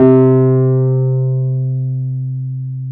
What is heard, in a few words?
PNO T.Wurly C 4; Keyboards of The 60's and 70's